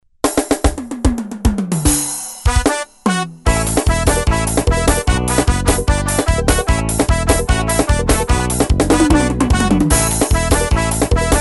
ritmos forró elétrico

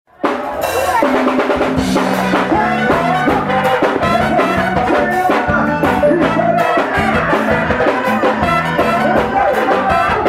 forro ao vivo

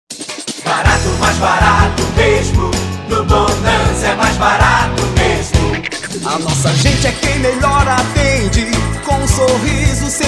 jingles supermercado

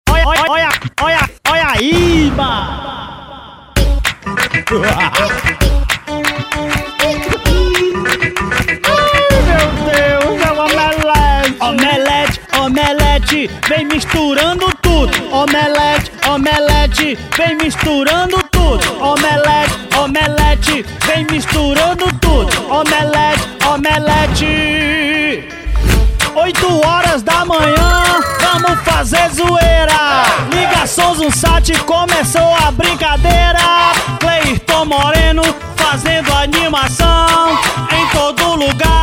funk

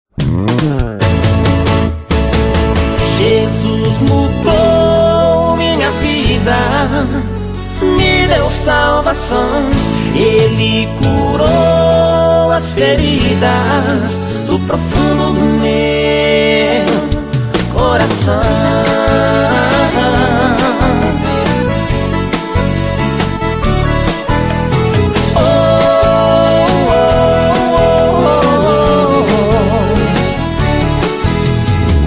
toque pra celular

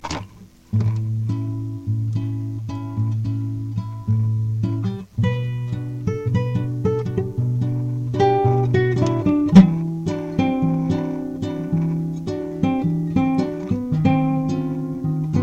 cancao de outono

